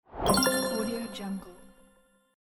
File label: win sound; tibasfx